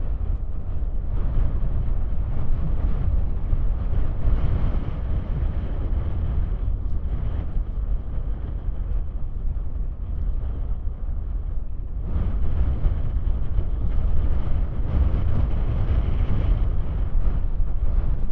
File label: WEATHER WIND Mountain Slope, Strong, Inside Jacket Hood, Sustained Blustery, Gusty, Binaural; Patago; Articulated Sounds; Rare Winds